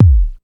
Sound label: Kick, Ensoniq ASR-X Pro